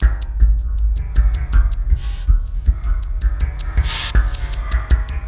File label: 80 FX, Industrial Loops; RISK